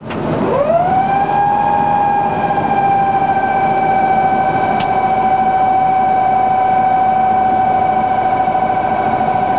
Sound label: comp start, Train; OpenBVE; 55022 Royal Scots Grey